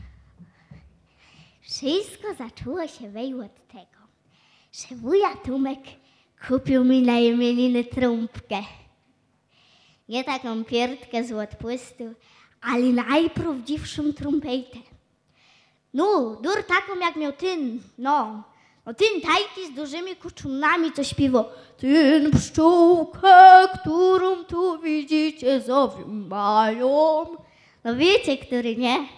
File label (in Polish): trombka